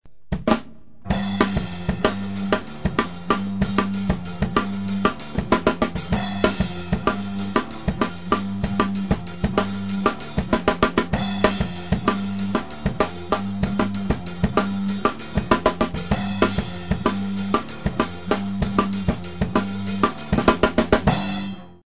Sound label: heavy rock